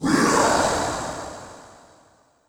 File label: Ice Death